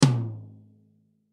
Drum Hit Tom Mid, Drum Hit Tom Middle; Drum Kit Sound Effects; Blastwave

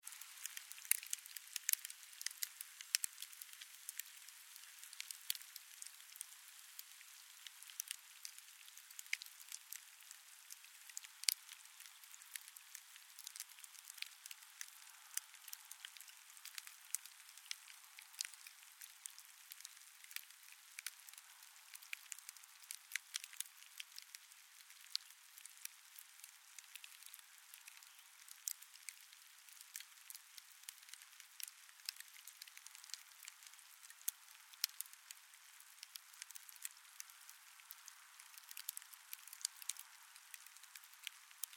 Fire Campfire Ashes Sizzle Snaps; After Dousing Of Water; War; Big Room Sound

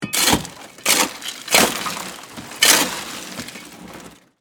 Ice Chest Scoop, Restaurant; Industry; Miscellaneous Bar; BlastWave

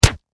Impact Punch Face Meaty Flesh; Punches and Hits; Combat

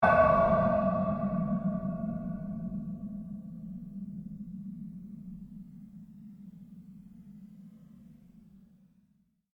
Created pulse fade long; sound building blocks created through manipulation of raw material; Rise And Dive

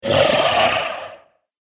Troll Warning Growl; Monster Sound Effects; horror

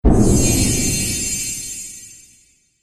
Amazing Magic Explosion; Magic Sound Effects

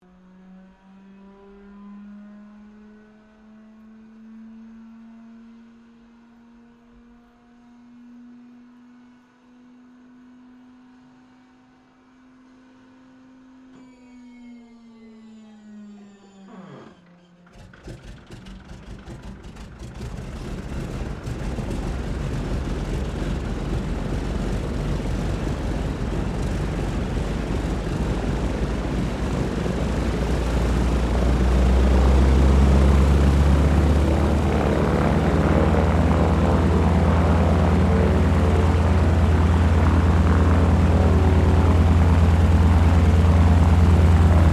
AIRCRAFT AT-6D HARVARD MK III STARTUP TAXI; Harvard Training Aricraft; Airplanes; Vehicles

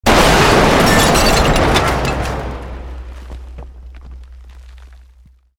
Building Collapse Medium; Created dramatic effects of buildings collapsing or breaking; Construction; SoundEffects-Foundation1

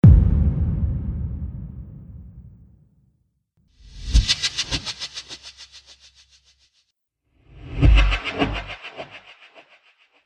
Cinematic Drum Hits; Sound Design; Hit Sound Effects

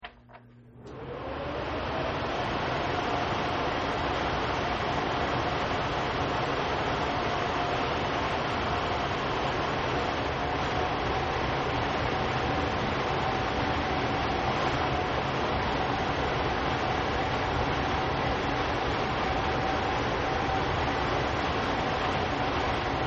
Tower Fan High, Tower Fan Running At High Setting; Fan Sound Effects; Electronics

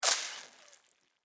Insect Spider Bite Suck; Spiders; Animals:Insects

